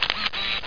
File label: camera